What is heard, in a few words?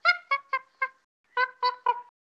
Monkey